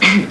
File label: cough; Sound Library 2000; Wayzata Technology